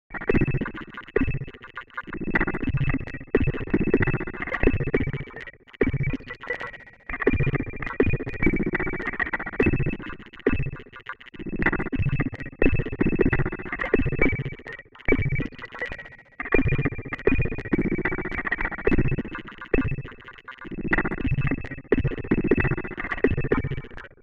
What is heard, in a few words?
groove filtered